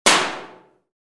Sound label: Metal hit impact sharp knock